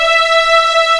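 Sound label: E5 legato, violins; East West; The Ultimate String Collection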